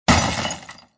Ice Asteroid Destroyed; Angry Birds Space

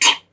spin

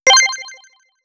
Collect

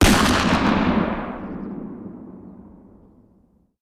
Gun Shot

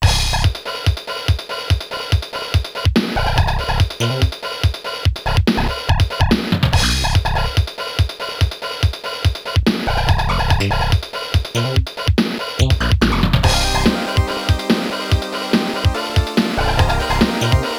Sound Test OPM

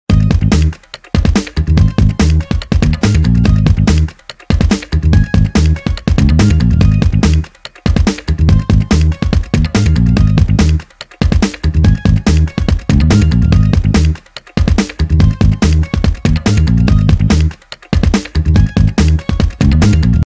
Minigame- Bomb Defusing (Normal); Trial Forest; Grand Chase

